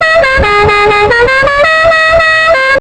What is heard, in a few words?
dixie Horn; garrysmod